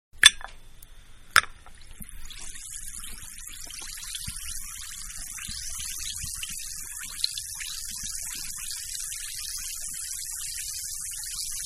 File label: Antacid Tablets-Dissolving; Fizzes; Objects; Manhattan Production Music